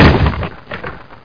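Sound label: wood break; Freeciv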